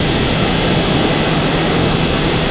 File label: swoop overload; Star Wars Knights Of The Old Republic I; XBOX